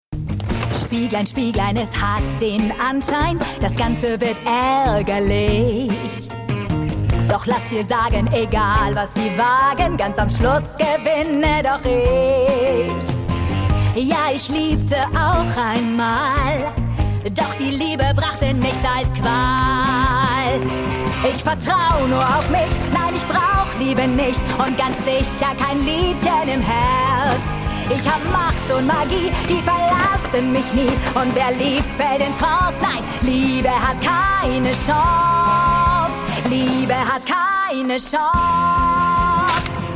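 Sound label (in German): Böse Königin Gesang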